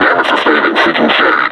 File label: injured, suppressor